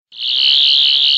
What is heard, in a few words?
Sonic Screwdriver